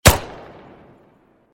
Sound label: weapon; Season 1 Uzi; Akimbo Uzi